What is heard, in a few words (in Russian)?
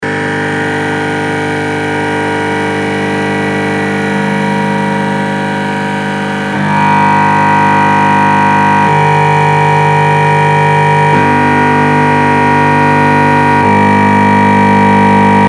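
звук помех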